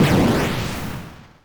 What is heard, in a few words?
Explosion; Cowboy; JotPK